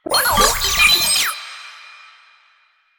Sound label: Sniper Drone Vocal; Warframe; Lasrian; Lasrian Drones